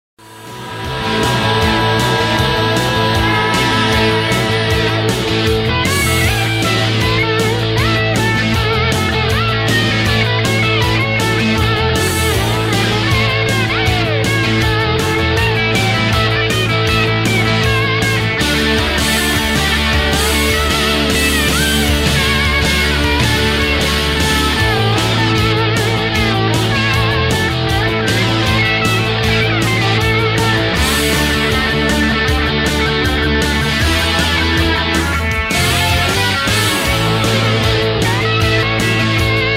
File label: solo